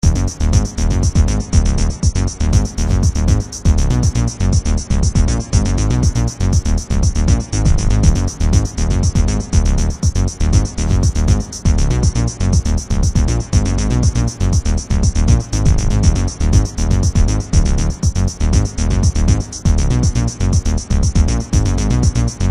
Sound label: drum bass